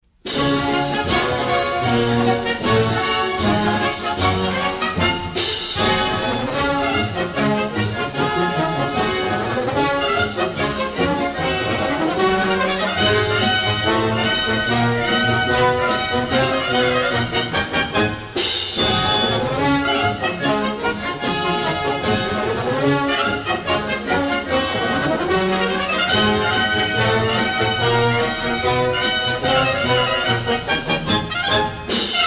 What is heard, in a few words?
Old Circus Band